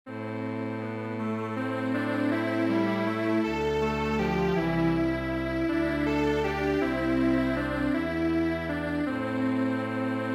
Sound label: Strings Quartet, traditional; El Pajarillo